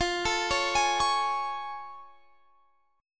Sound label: A Major 7th F; AM7/F arpeggiated